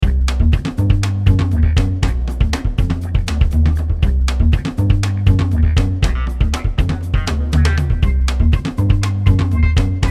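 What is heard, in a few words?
electro funk jingle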